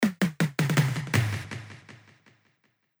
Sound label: Toms